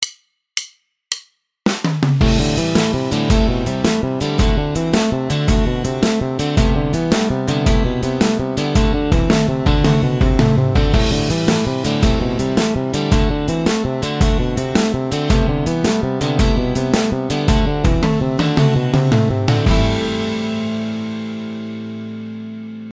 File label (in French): tapping